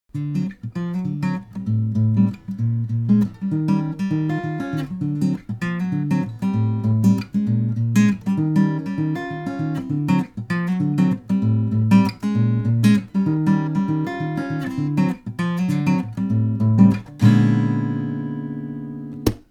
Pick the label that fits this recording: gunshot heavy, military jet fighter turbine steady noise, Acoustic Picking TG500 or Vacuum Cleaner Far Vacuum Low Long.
Acoustic Picking TG500